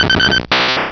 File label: cry; source of the sovereign